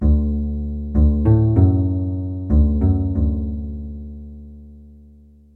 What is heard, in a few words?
guitar